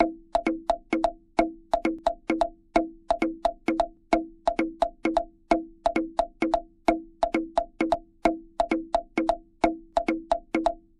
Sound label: polyrhythm 3 against 4